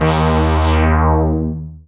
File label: SYN RESO, Analog; Sound & Vision - Gigapack I CD 2 (Roland)